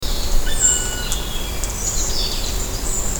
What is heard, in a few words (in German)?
schwarzspecht anwesenheitsruf; erlenbruch